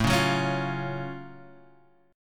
F sharp Minor Major 7th A

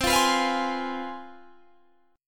C Major 7th Suspended 4th Sharp 5th, down; CM7sus4#5 strummed

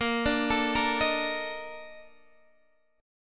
A sharp Major 11th A sharp Guitar Half Step Down

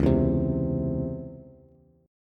C sharp Minor Major 7th C sharp Bass, chord; strummed; x,4,2,5

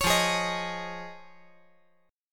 F Minor 7th Sharp 5th

